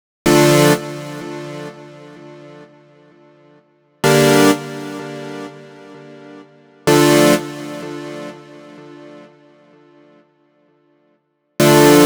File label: 128 Dm synths, Complex Electro Synths; Prime Loops; Deus